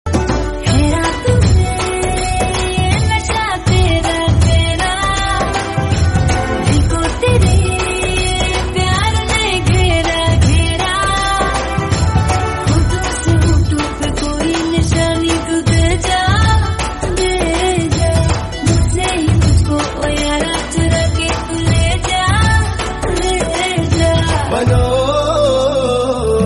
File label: song Hindi